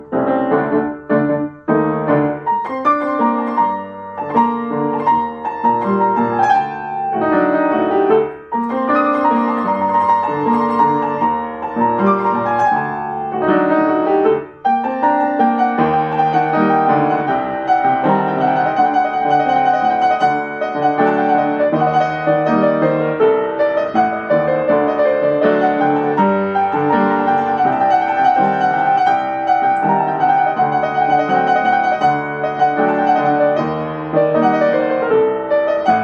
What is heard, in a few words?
Piano